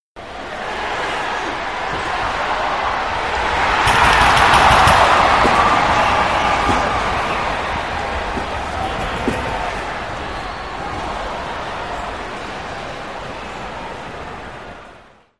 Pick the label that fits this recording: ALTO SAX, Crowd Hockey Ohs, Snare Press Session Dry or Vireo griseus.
Crowd Hockey Ohs